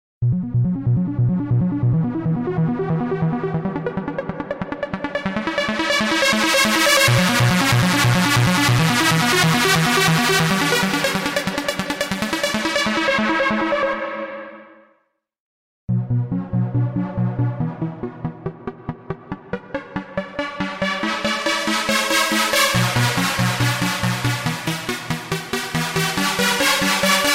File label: Dirty Trancer